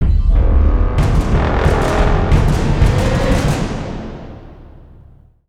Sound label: boss appear